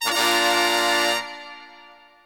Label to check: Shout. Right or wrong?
Wrong